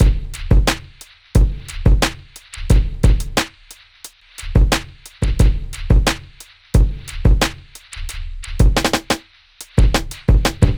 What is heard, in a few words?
Drums, Hip Hop